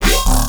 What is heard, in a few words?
Alt Fire Growl; weapons; Warframe; Infested; Arm Cannon; Coda Bubonico